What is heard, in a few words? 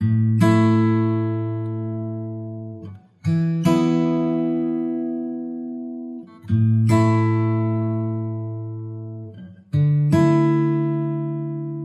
Bright Sunny Afternoon, No Drums